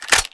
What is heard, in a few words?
svd magin, weapons; Counter-Strike Pack